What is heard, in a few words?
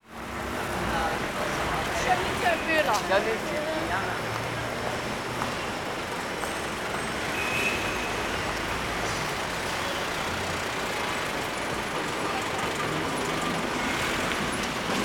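sounds of cars, traffic jams and car traffic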